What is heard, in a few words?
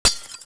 glass sheet shattered with rock